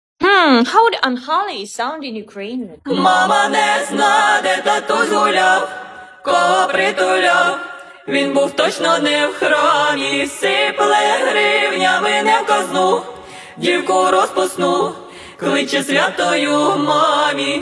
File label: ukrainian